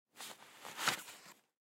Hockey Glove Off, Hockey Glove Slip Off; Hockey (Sound Effects:Sports)

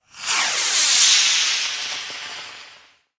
Rocket Launch, Scifi, Lift, Off, Flare; Science, Fiction; Spacecraft; BlastWave

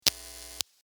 sparks, Electric Spark; Sustained Hum And Spark , From Guitar Cable

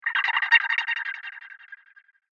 Engage Cloaking Device, spacecraft; Spacecraft Sound Effects; science fiction sound effect

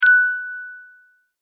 multimedia tone correct

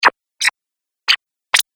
4 Game Sounds Blips; Video Game Sound Effects

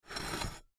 Plate Slide Wood, Plate Slide Wood Table Dish; Household; Dishware

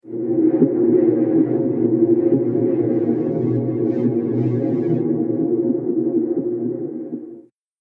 Dungeon Atmosphere, scary soundscape; Fantasy Sound Effect

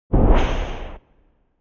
Whoosh Short Tail; Whooshing sound for video editing, movements, logos, flash, or animation.; Whooshes

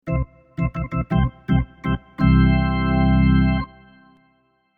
Organ Fanfare, Cheesy fanfare on electric organ.; Musical; Miscellaneous Musical

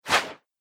Archery Arrow Whizz, bow and arrow

